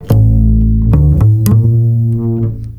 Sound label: Stick Bass F; Total Drum&Bass